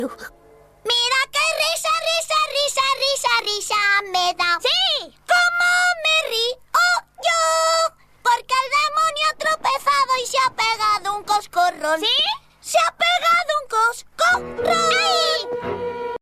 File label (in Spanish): risa risa